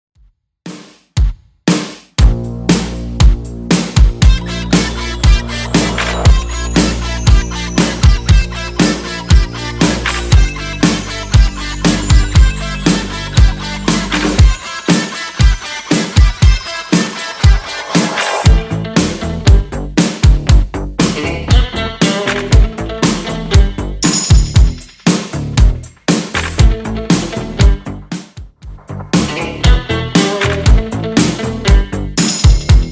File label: 80s Redrum 118bpm